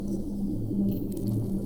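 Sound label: Music Dpad Evaporate 145; from dust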